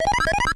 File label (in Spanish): error actividad